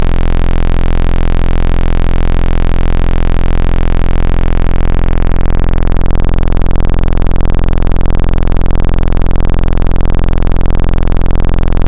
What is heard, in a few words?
Triangle Saw; Wavetables; Synthetic's Serum Collection; ESW Core Wavetables; Echo Sound Works Core Tables